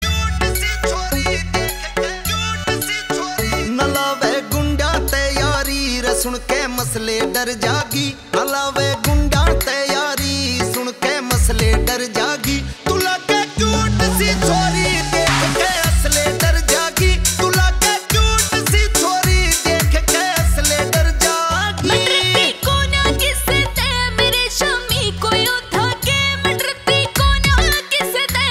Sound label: Ringtone